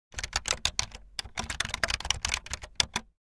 Bluetooth keyboard typing fast

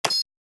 Cash register checkout single button press

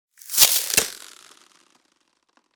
Ice spell frost freeze hit

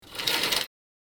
Wooden rail curtain rings slide dry squeak; blinds

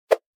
Fast swish

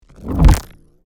Energy particles whoosh

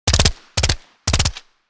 Thompson submachine gun two three round bursts; war

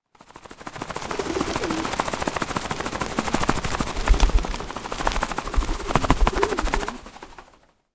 Pigeon flapping wings birds

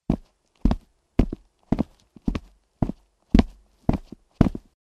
Footsteps walking on wood in dress shoes

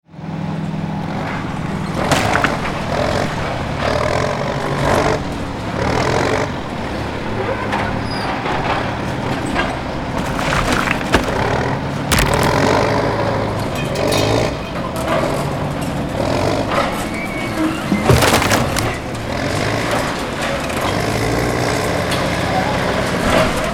Bulldozer demolition debris impacts

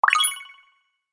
Sale pop up purchase alert

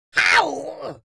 Witch fantasy vocalization pain hurt

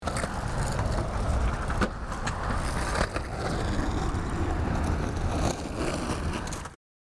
Skate park ambience

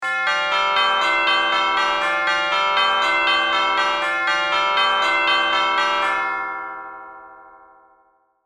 Church bells ringing chimes wedding; large bell

